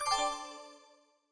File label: Win Stars